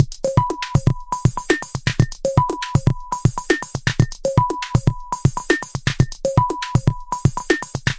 thin electro business ringer, 120 bpm, drum loops and breaks